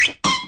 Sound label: high pitched slip and fall